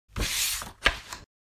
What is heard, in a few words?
Large book manual page turn